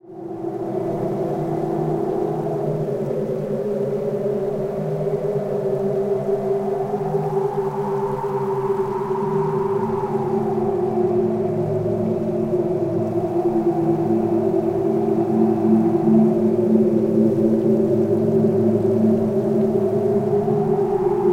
ambience - Dungeon Entrance; fate 2 undiscovered realms; Typhon

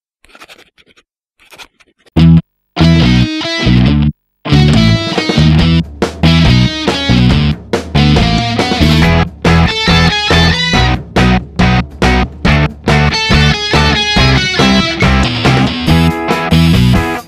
Swamp; Shrek Smash n' Crash Racing OST

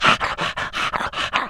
Dog Idle

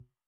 Loop Tail; Good Job

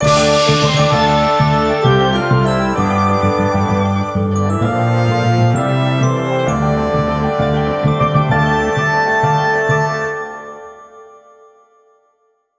Team Victory; TowerFall Ascension

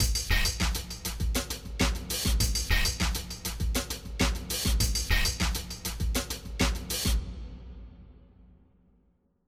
Drum Layer

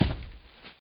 Animatronic step

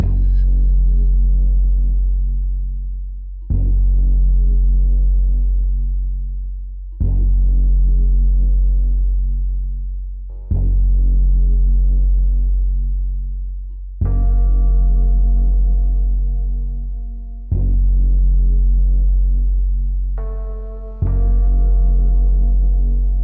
Caves Bass+Keys; gonner linux macos ps4 switch windows xbox one gamerip 2016

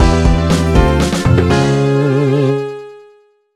Jingle Game Over; Beastly Frantic Foto; NDS

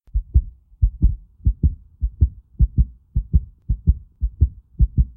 heart

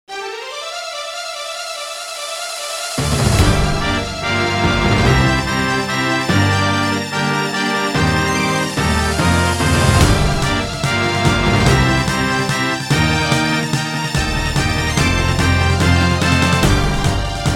Boss Battle; Lunar; Fireheart Legend of the Paladins; RPG Maker

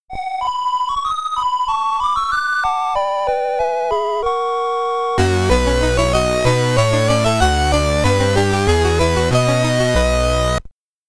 Ending (SID Stereo), Commodore 64

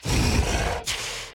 Walk Cycle Burns; The Town

